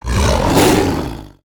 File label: Attack